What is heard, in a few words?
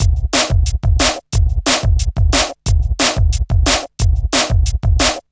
Future Beat, Drum & Bass; Fluid Dynamics